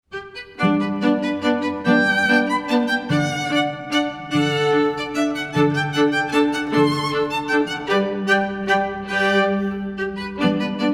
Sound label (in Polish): walc g dur